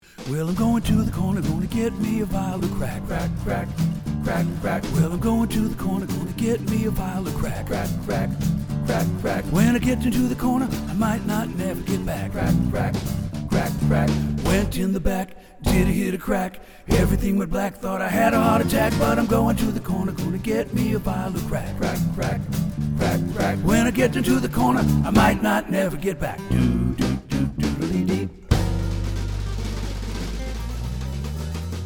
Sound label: Crack Vial Blues